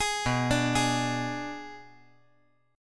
B Minor 6th B

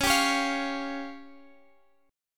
Db Major Flat 5th, Guitar Drop D

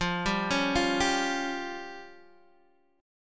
F Suspended 2nd Sharp 5th F down Guitar Open D

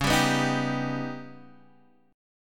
Eb Augmented 7th

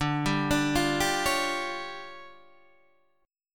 D Minor Major 7th Double Flat 5th D Guitar DADGAD

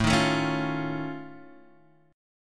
F Augmented 7th A, chord

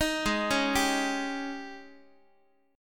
G sharp Major 7th Suspended 4th, Guitar

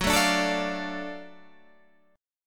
Gb Minor Major 7th Sharp 5th, Guitar, Standard; strummed; 14,12,12,14,15,13; JGuitar

